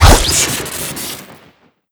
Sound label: miss crit; weapons; genji